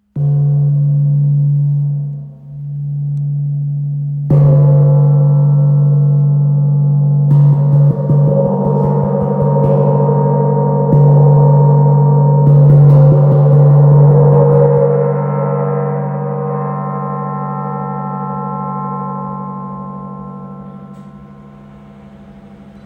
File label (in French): Chau gong 60cm sol dièse